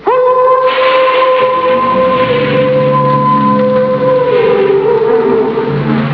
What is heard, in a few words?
Howl; bladrunr